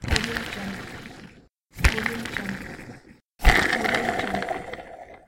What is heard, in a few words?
spinning wheel; urbazon